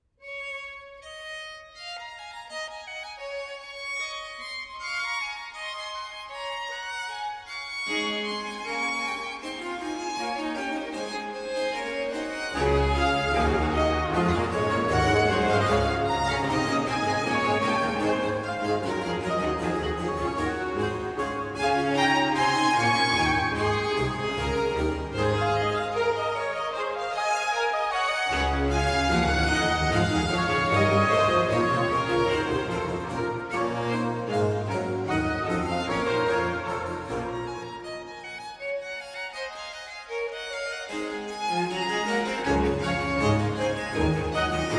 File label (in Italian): Allegro [fuga]; Arcangelo Corelli; Concerti Grossi op. VI; Concerto VII in re maggiore